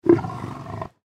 Leopard Growl Fast; Animal

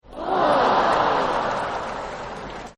Woah, Applause; Partners In Rhyme

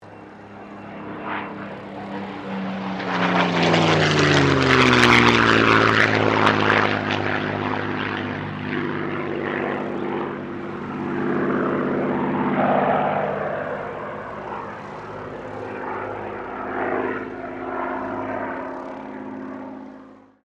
AIRCRAFT SUPERMARINE SPITFIRE MK-XVI PASS; Airplane Sound Effects; Vehicles

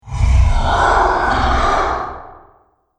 Old Powerful Dragon, monster; Monster Sound Effects; Andrew Fleming

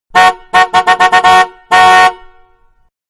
Car Big-Horn-Several, Exterior; Vehicles; Cars; Manhattan Production Music

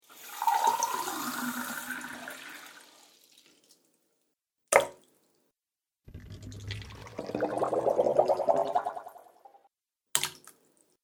Water, Splish, Splash, Splosh; Water Sound Effect

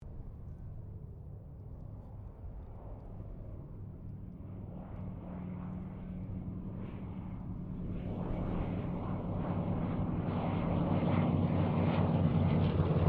C195 squadron 2 pass, Airplane; Squadron of 2 Cessna C195s

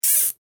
Industry Cable Tie Short Zip; Factories (Sound Effects:Backgrounds)

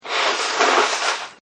Nose Blow; Sickness Sounds; Human

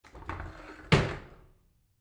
Closet Door Slide, Closet Door Slide Open; Household; Blastwave

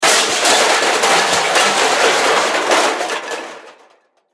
Crash Metal Debris Small; Debris (Sound Effects:Materials); Blastwave

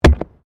PLASTIC RUBBER PIPE

